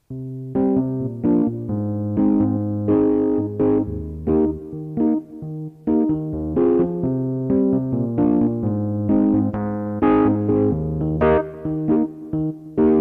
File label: EP; S90